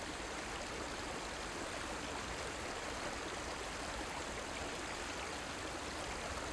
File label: River-Background; Platoon; Ambiances